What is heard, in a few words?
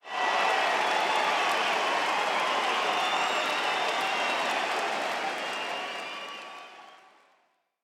cheer kill